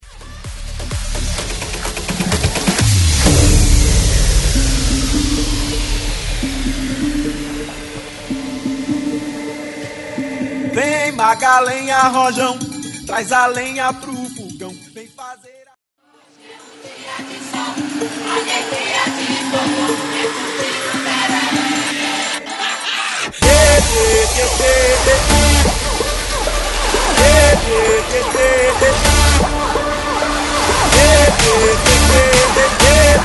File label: Bass Bootleg